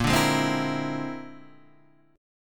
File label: Bb 7th Sharp 11th Bb; Bb7#11 strummed; JGuitar